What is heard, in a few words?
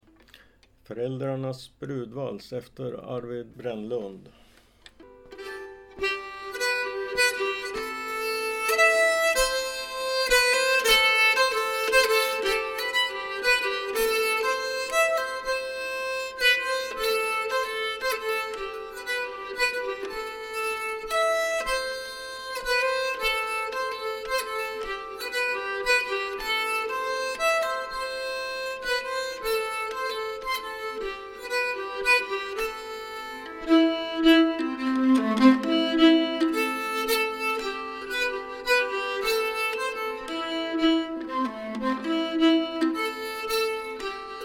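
brudvals, bridal waltz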